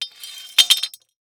Weapon Broad Sword Place On Stone; AdobeCC

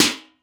Weapon Armor Hit; AdobeCC

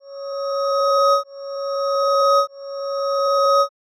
Science Fiction Sci-Fi Electronic Alarm

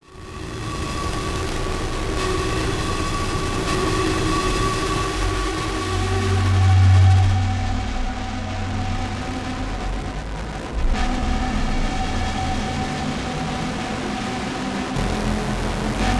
Distortion Static Pitch Shift; Adobe; Production Element; Imaging

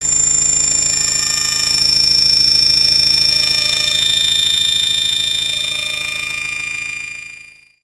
Title Transition Communication Distortion Jammer; Adobe; Production Element

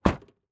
Transportation Chevy Trailblazer Close Trunk

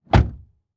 Truck GM Envoy Close Door Interior; transportation

